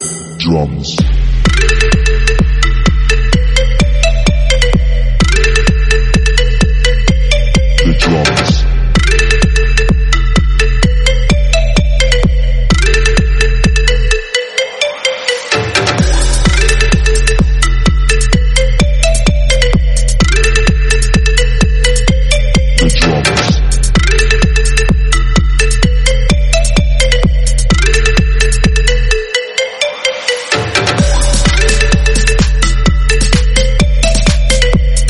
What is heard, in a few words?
drumz